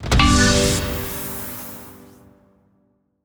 scatter Hit; netent

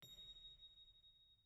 reel bounce; netent

